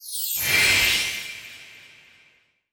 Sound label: Ingame Score Plus Waveclear